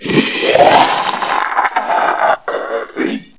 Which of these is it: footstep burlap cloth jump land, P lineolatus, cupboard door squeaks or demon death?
demon death